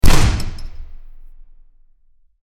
gun

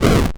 dash

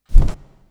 jump

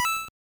Coin Pickup

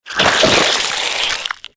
slimy goon

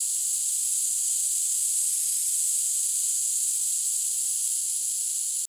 Weapon Cooling; FPS; Weapons; FirstMicroGame

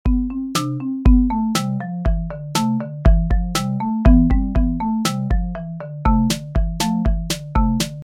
music fun kid; game; platformer game

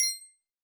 Collectable

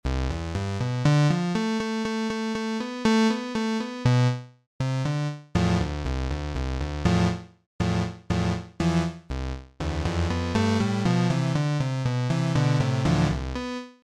bg music

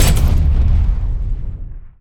shot

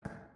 footstep; The Mercenary Chronicles

